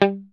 STRAT, Classic Guitars; E-MU Formula 4000 Series Vol. 3 – Analog Odyssey

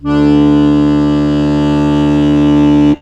HOHNER, akkordeon

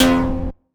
stab zip, stabs

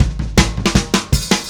Happy Pub Beat Fill A; Sonic Foundry (Sony Creative Software); Indie Rock Anthems; Session 05 - Happy Pub

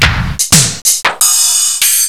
power elekit; Rubber Chicken Software Sample Bank

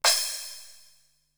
Crash Cymbal, Drum Machines Ensoniq SQ-R-Plus; 300 Drum Machines

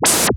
Noise Hit

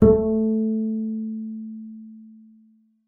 A3, bass pizz; Xsample VOL-5

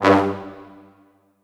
Orchestral Brass

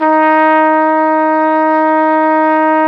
Flugelhorn; Roland LCDP12 Solo Brass

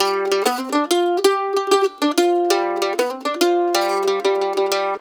LICK, Blues Guitar

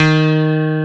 CP70 D#2, E.Piano; E-MU Producer Series Vol. 2 – More Studio Essentials; CP'd E.Piano

